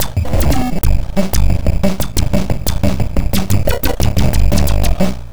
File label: both noisey 090bpm; Zero-G; Downbeat Dance Grooves